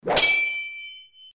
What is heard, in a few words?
Sword Block